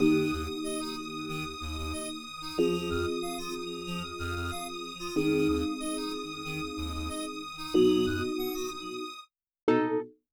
Keys FX; Multitrack

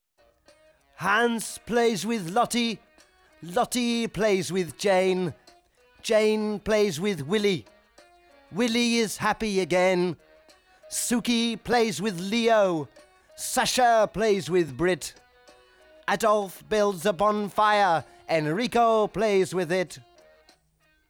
Main Vocal Verse

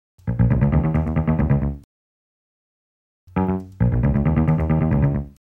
Delay Bass, 135.97; 3A

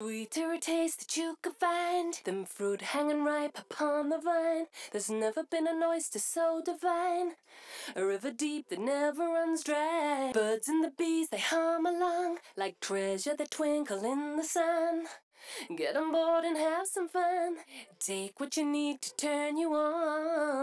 Vocal; Multitrack

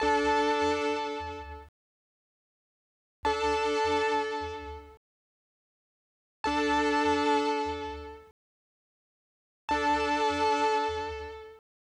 Middle 8 Under Keys

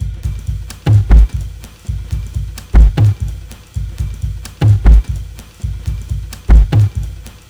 Beat 128 bpm; ElectroSwing; WSL-ElectroSwing Vol 4 Additionals

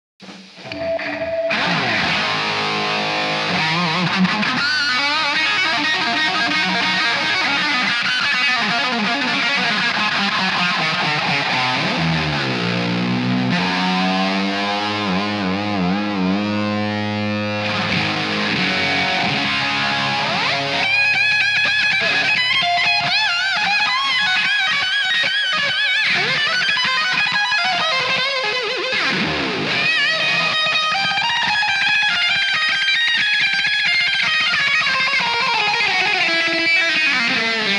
guitar

